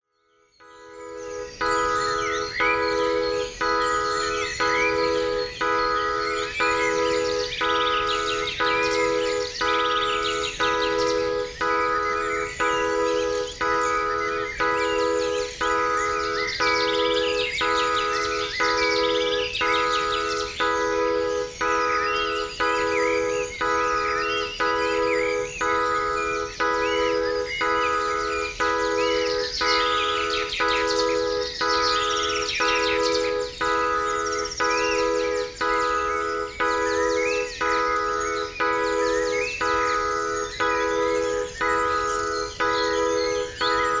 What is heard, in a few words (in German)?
Bilaterale Stimulation 60cpm mit Naturklängen